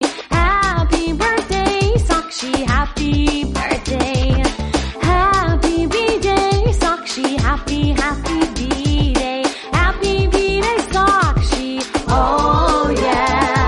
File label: happy birthday Telugu